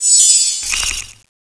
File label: magic knife draw